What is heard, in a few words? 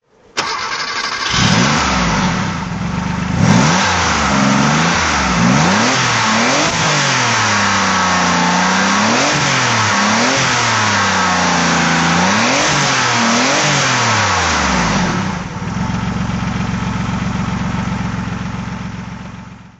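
porsche 959 throttle; Porsche 959, 1988; Engine sound; V3D/ ClassicarImages; Marc Vorgers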